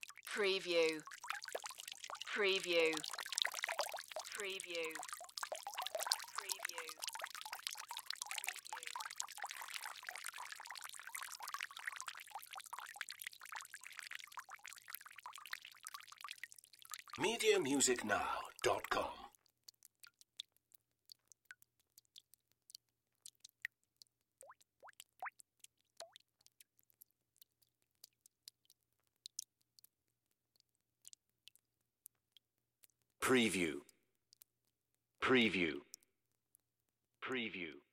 Water Drips Steady; Sound Effects Bible

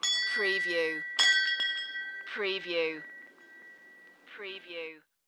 Ship Bell Two Rings

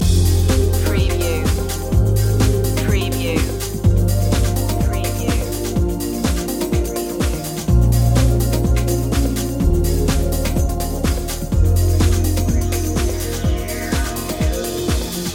trip minimal loop

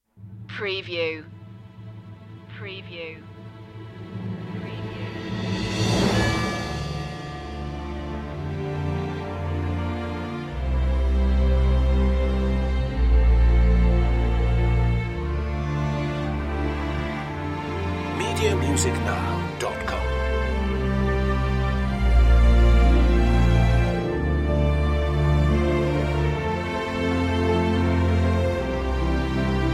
think back no choir

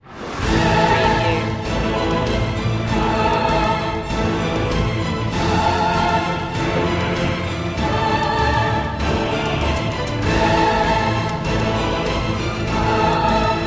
full mix

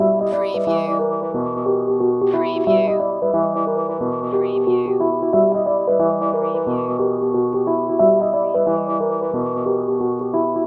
Hip Hop Dark Rhodes Licks 90 BPM Swing - E

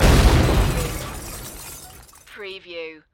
Explosion Glass; Action, Impacts & Explosions

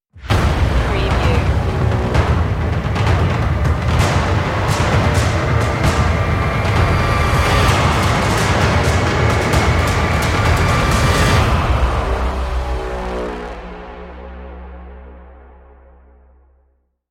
industrial impact stinger

